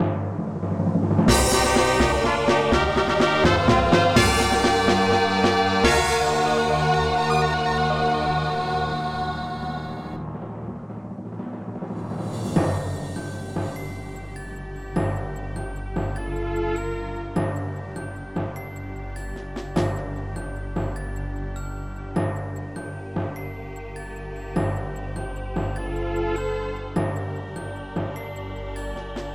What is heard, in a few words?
Epic Win!; project exile prototype; gba